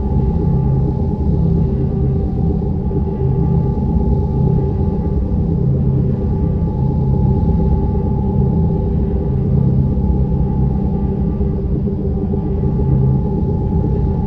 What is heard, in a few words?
destroyer idle; caravaneer; Stellaris; megacorp